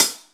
closed, Drums Percussion; Drum Bass; Zero-G; Total Drum Bass

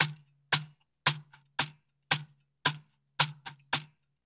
SHEKERE; Spectrasonics; Liquid Grooves 1 & 2

